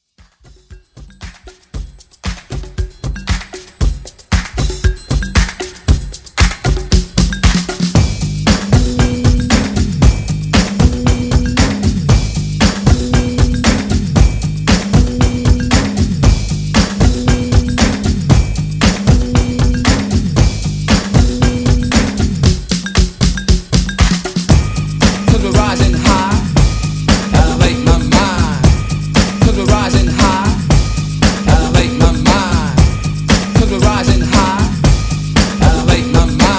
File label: Old School Redrum